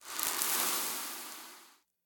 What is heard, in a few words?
Seed Movement